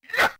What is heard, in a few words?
kick